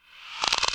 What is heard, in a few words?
rattle